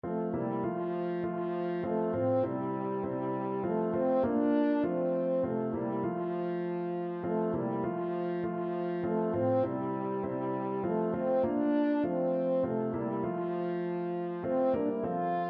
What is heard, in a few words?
french horn